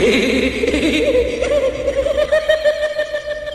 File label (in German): hyanen lachen